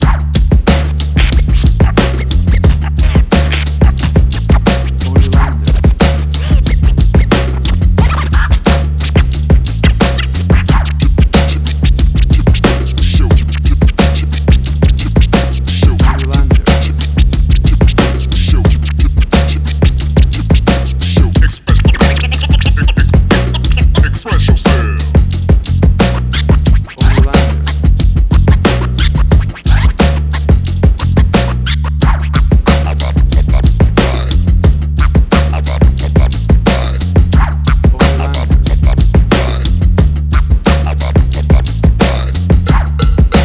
90s Hip Hop; Vernon Avenue

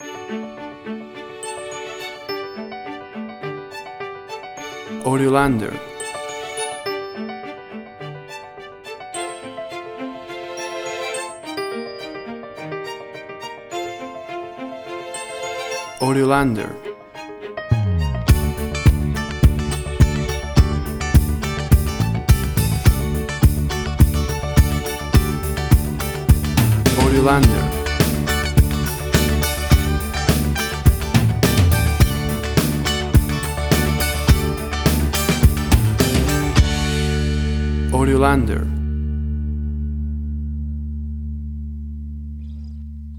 inspirational

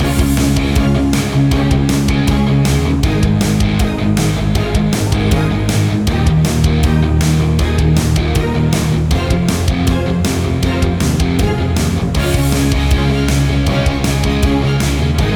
Big Punk Rock Orchestrations

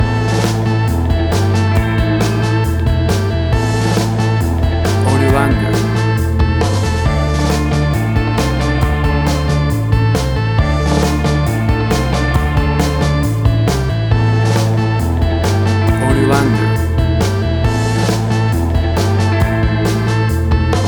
60s Surf Ballad Music

